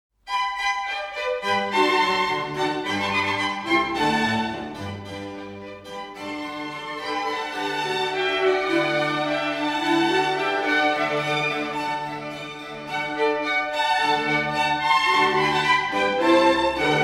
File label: Flute Concerto No 4 in G